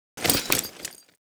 knife bowie inspect end; weapons; Counter Strike Model Pack; CS2

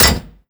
step layer clink, soldier charger; combine

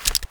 stilletto flip, knife; weapons; Counter Strike Model Pack; CS2

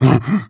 breath short, zombie; creatures

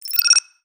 tripmine explo beeps; weapons; Half Life Alyx

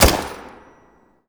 mg mech, weapons; combine; Half Life Alyx

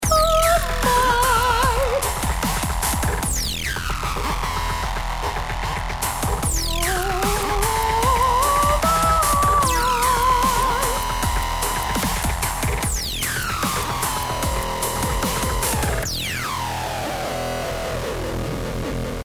start round, music

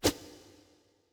swish, halloween; player

